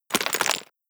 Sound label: debris concrete, bullet impact debris